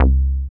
BAS, Eurotech; Sounds Good

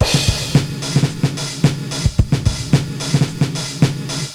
80s Gated Verb Korg MR-16 (110BPM), cyborg beats

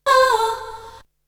Vocal; ZERO G - Datafile DISC 3

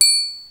TRIANGLE, HR-16; Hollywood Edge; The Big Bad Beatmachine

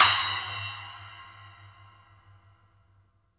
SPLASH A, SPLASH; Sampleheads; New York City Drumworks VOL-1

